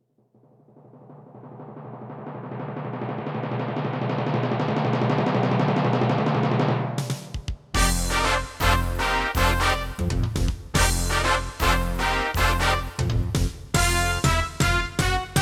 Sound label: CM500 GS; LSL3